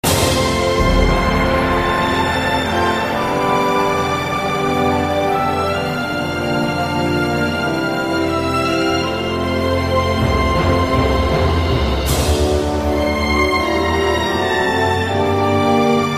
Victory

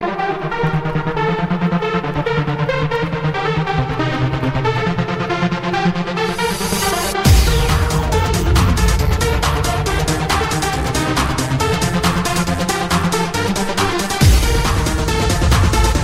Techno

